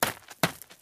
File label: run gravel; Ben 10 - Escape Route